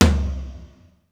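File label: floor hi tom; sr16 tom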